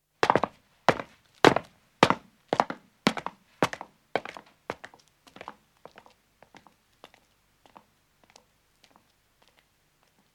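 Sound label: Footstep stone descending, 2 men depart; Footsteps (Sound Effects:Human); BBC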